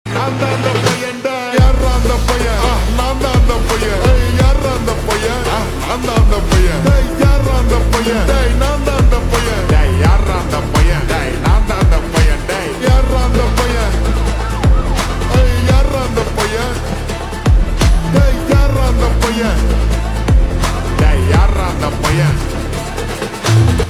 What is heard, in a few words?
Slowed Reverb